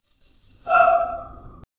angry medieval crowd shou; anger

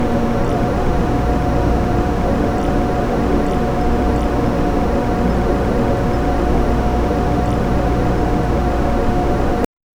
minimal operating room am; hospital

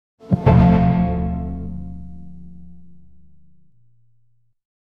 anime rock sting for tran; anime rock sting for transition

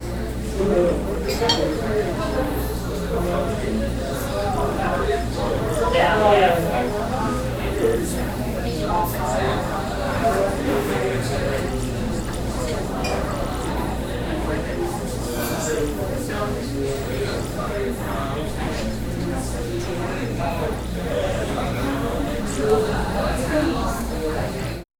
calm cafe restaurant ambience

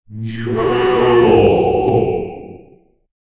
great demon chanting

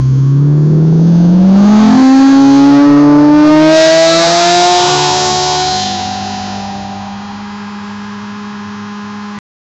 ferarri f2004 acceleratin, formula 1

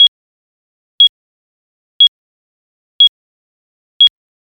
count down sound like clo, clock

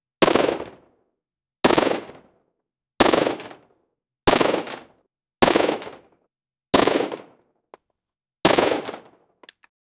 spas 12 shotgun shell ins

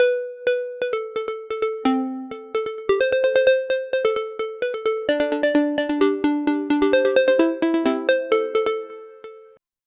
little score sound; sound effect

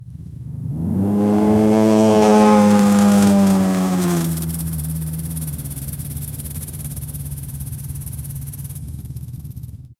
realistic sound of a herd, snorts

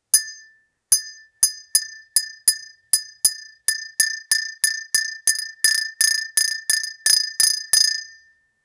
a short high pitched tap, crystal

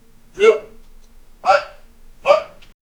the man strains and lifts, dumbbell

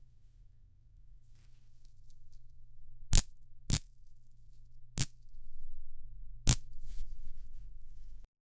flying stick

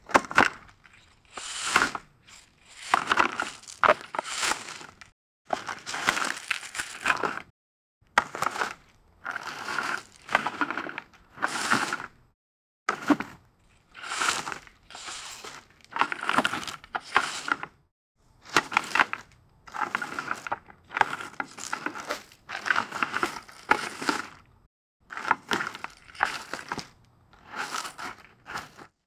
satisfying harvesting sound

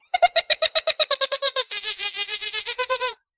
little goofy marshmallow, creature sounds

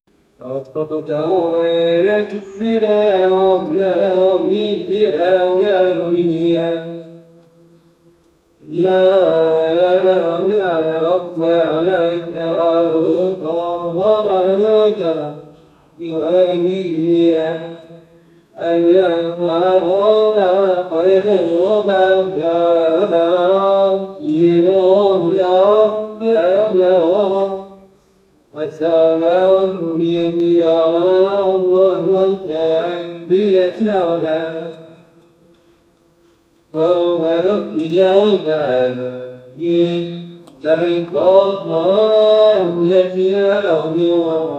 prayer islam and jews and, ceremony

